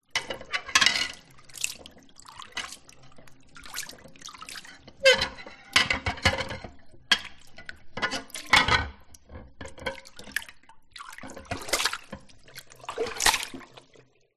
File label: household crockery movement kitchen sink water inside bowl not sink